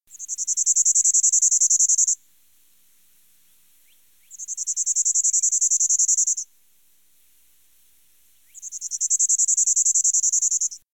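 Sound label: bachman's warbler; calls; Audubon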